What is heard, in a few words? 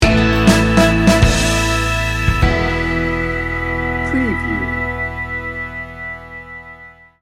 sting bumper